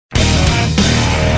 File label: riff